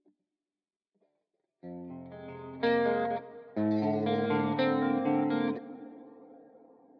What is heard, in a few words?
Guitar